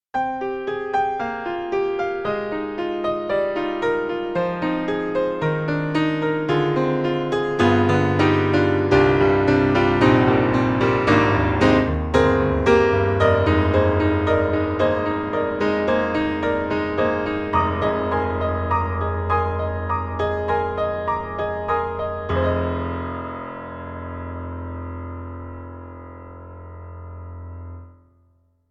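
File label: Yamaha C7 Player Ballad in Ballroom, piano; Steinberg; The Grand 3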